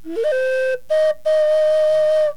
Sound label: Ripple Vibrato; Dvorak